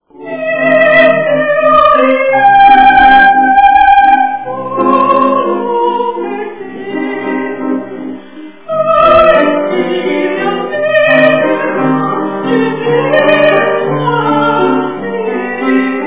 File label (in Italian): sopran; tumulazione